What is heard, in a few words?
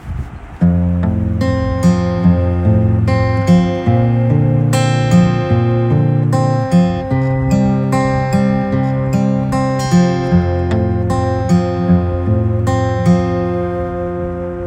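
G chord plucking, acoustic guitar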